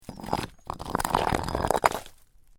Stone Sliding Down Stone, A stone slides downhill on rocky surface; Rock Sound Effects; LYN100 package of Stone & Wood sounds